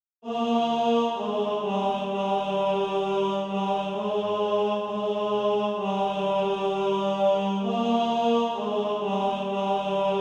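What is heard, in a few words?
tenor, SATB Practice Music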